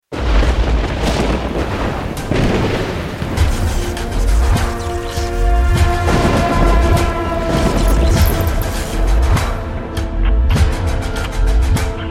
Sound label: Theme Music